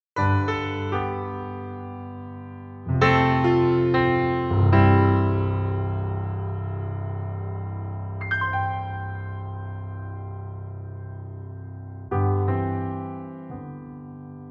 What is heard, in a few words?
Steinway D, piano; NY